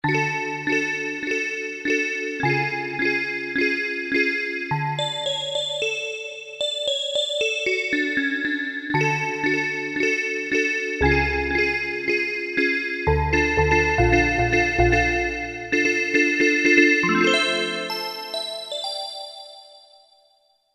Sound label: CLASSIC PPG, Akai SG01v